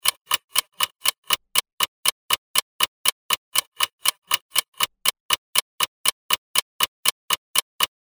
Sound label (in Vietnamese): Am thanh dong ho tich tac